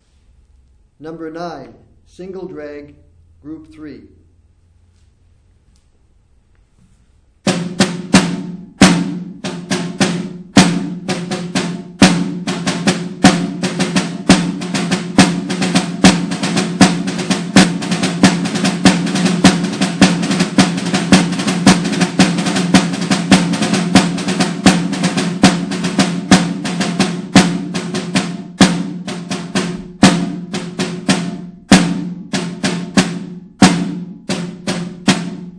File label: snare rudiment